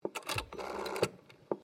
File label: TELEPHONE WOODEN AUTOMATIC DIALING WALLPHONE DIAL